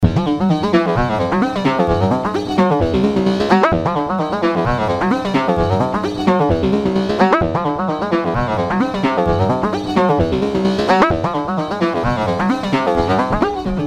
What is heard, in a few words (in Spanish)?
Bateria; Court Society; One ban manned